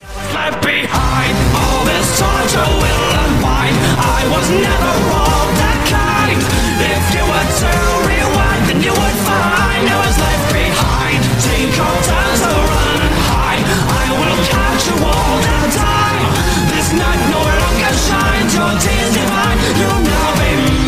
Remix

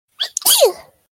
Cute little sneeze

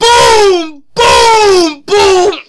Boom

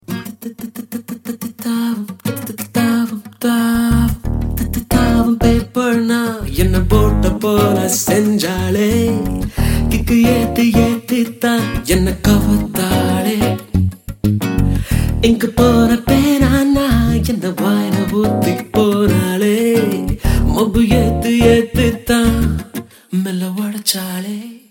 Bass

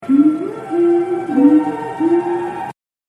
Hums; Midna

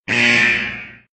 Wrong Answer

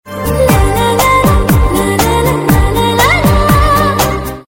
Sweet Tone Lalaalala

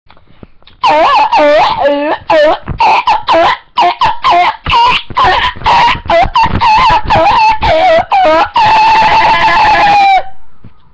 singing

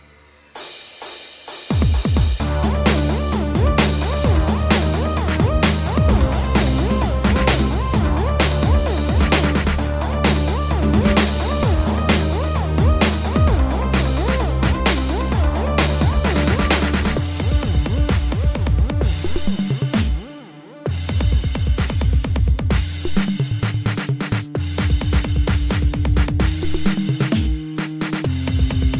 DROP BEAT

